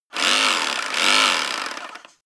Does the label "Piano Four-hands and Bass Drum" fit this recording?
No